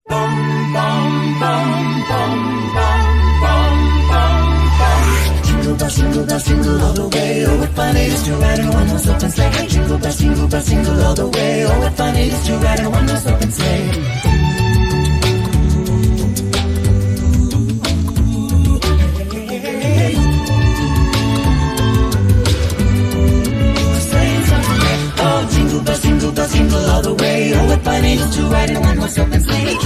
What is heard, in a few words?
jingle bells acapella karaoke